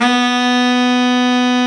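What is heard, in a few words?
baritone sax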